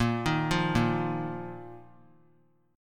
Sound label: Bb Suspended 4th Bb down, chord; Bbsus4 arpeggiated